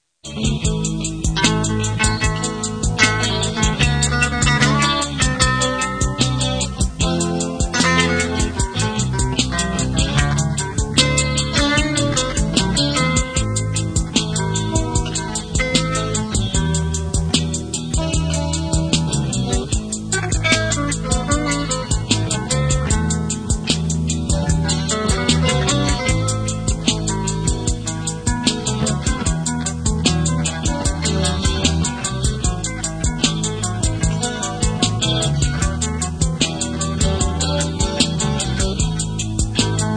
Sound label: clean, guitar; 86 squier